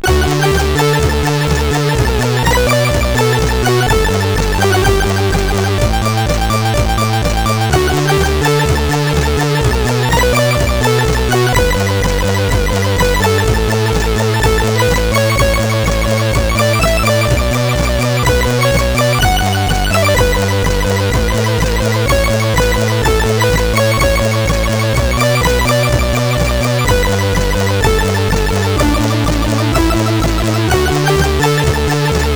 Cat Rap, CSG8580R5; sid

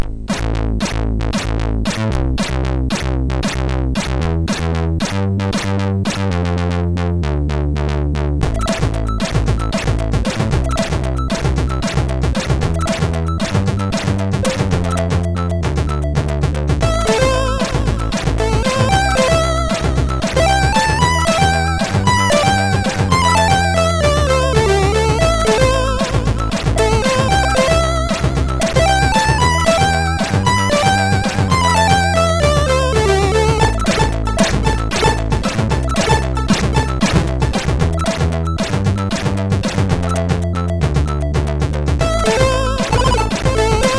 remix, MOS6581R4

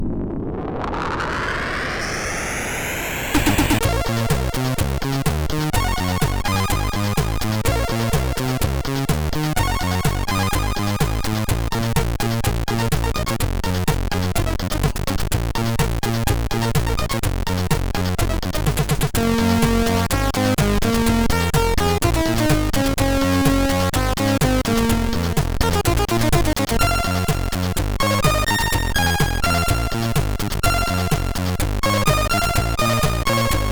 Disco Night sid MOS6581R3

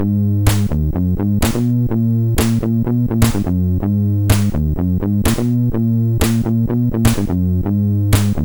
Sounds, MOS 6581

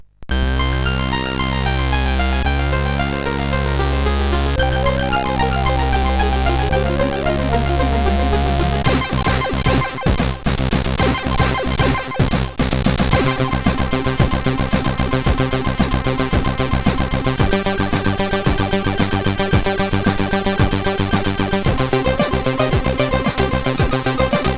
sid CSG 8580R5

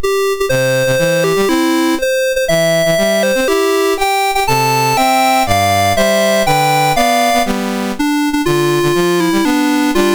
Honduras, National Anthems, MOS6581R2; Dave Marquis

